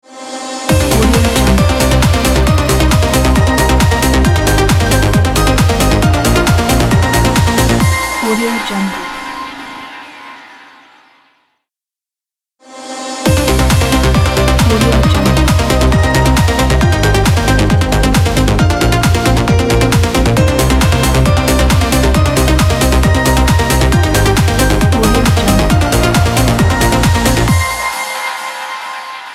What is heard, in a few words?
uplifting trance opener ident